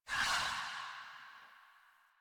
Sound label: Generic Footstep; Warframe; Choir; Foley